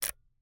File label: Camera, Kinusa K77, 50mm lens, plastic, serrated, lens adjust, quick; Eiravaein Works; Vaeyan IV